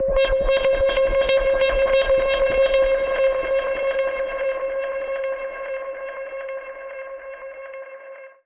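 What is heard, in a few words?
alarm siren, modulation processed, 170bpm; musicradar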